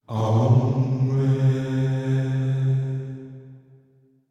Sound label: Rand Chant B; Warframe; DE; Hubs; Syndicate Arbiters; Tenno